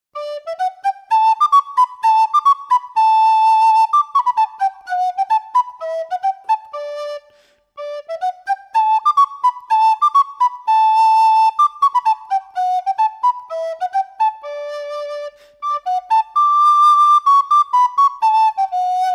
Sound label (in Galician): trad, Pito Galego